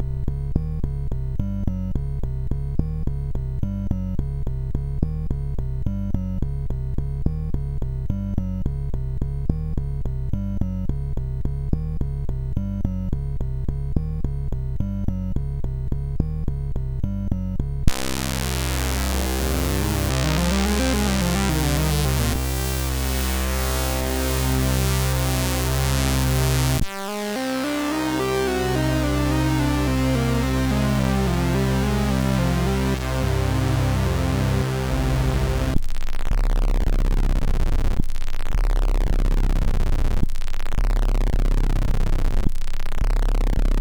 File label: MOS 6581R4